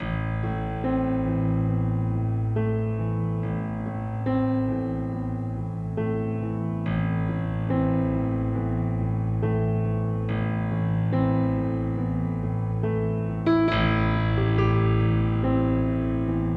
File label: Cat Relax